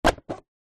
foley knife serrated stab into empty plastic milk bottle